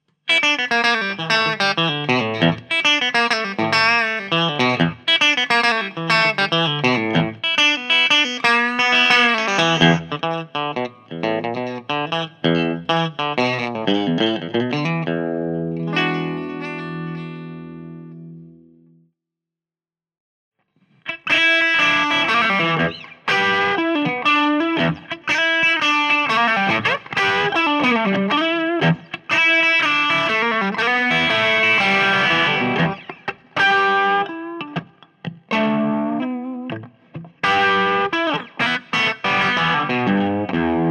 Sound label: Strat Bridge